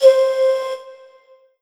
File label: Alesis S4 Plus Pan Flute C5